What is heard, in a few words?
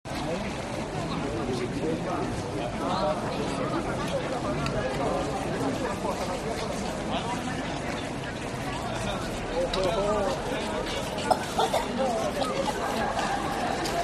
prague crowd day zahrada na valech garden on the ramparts; not far from katedrala sv vita